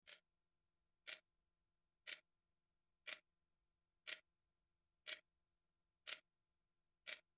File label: Clock ticking